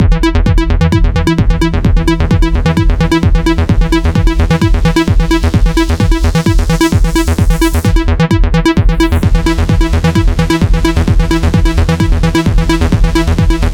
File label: Trance Lead